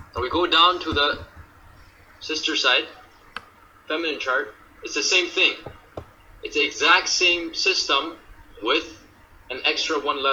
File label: Femine Dual